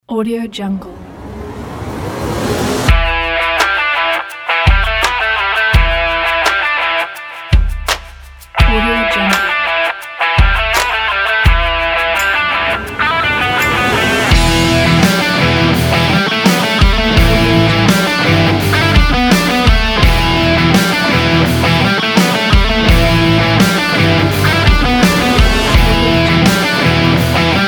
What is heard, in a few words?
stylish hard rock